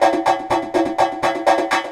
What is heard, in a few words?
Echo Chuff, Synthetic Pulses